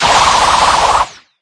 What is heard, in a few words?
Punches; ivankov; battle